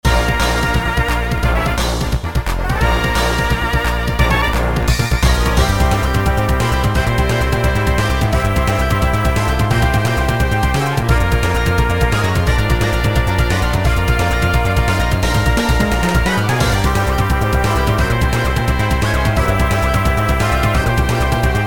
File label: battle champion; PokeRogue